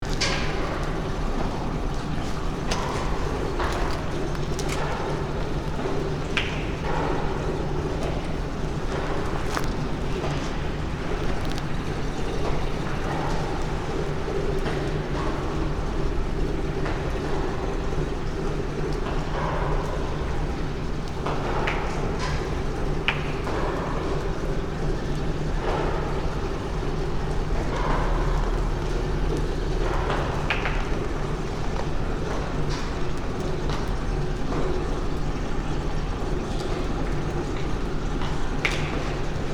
splashes and running water; Even more splashes and running water; building site; Walking up a staircase at the building site, splashes

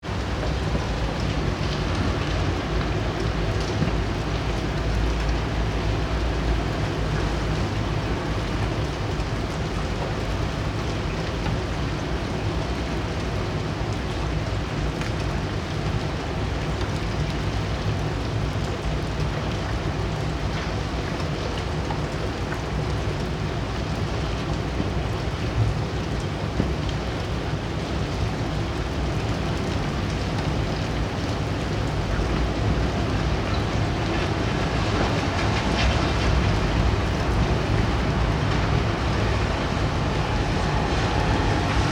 passing car; Passing of car

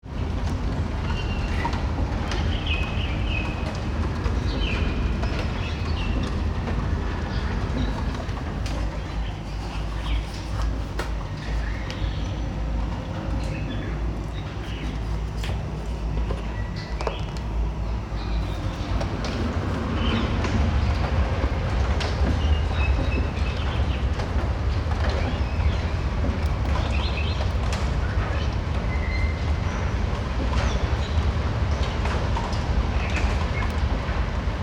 dripping rain and birds shanghai suburb; Dripping rain and birds singing, a Shanghai suburb (1)

